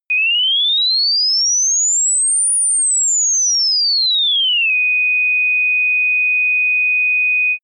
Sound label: general rise and fall sine